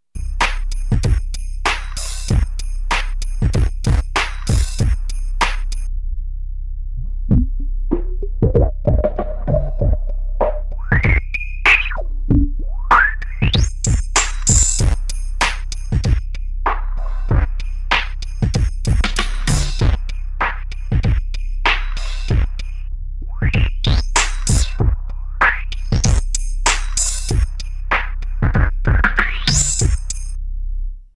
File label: filter, redsound darkstar xp2; H8/3002 family (16/32bits) + dedicated audio DSP ADSP-2185; TEST: filter